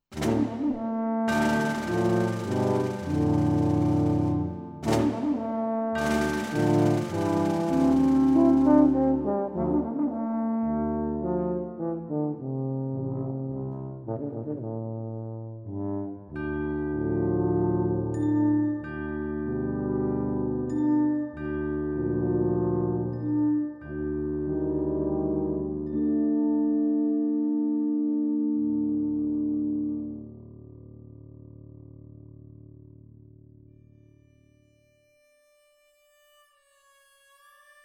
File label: Tuba Trio